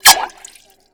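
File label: SPRAY BUBBLES, percussive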